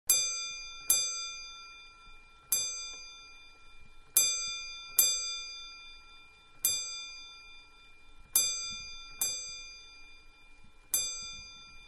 Old Wind Up Clock Bell Alarm; Clock Old Wind Up-Bell; Clocks (Sound Effects:Machines); Manhattan Production Music

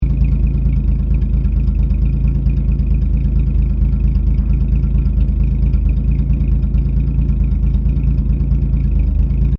Car Holden Fe 1957 Idle Exhaust Loop

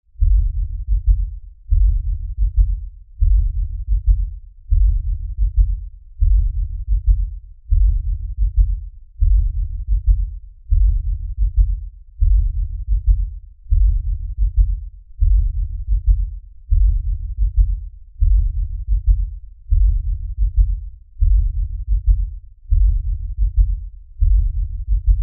Lfe Pulse Low Bass; Drone; Sound Design

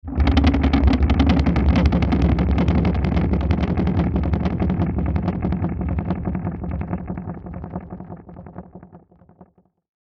Descend Crackle Rhythmic Delay; Descending Sound Effects; Blastwave

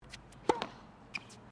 TENNIS SERVE FEMALE, Local tennis club

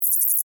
Insect Voice Chirp, Vocalization, Bug; Animal

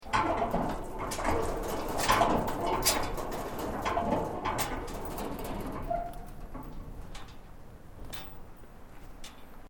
Metal Creak Soft; Material components to create more complex sounds; Materials

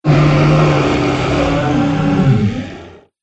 Nightmare Beast Roar; Monster Sound Effects

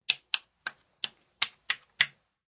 Moving a game piece 7 spaces on game board; Part of Board Games (Sound Effects:Games)

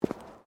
Footsteps womens low boots concrete step; Footstep Foley; Human

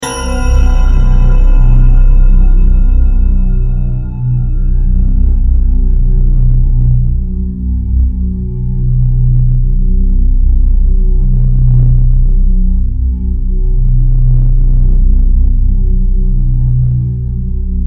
Synth, Stab, Drone, Horror, 5.1; Chimes, Creepy

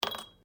Ice cubes Glass; Drink Mixing Sound Effects; Drink Mixing (Sound Effects:Bars)

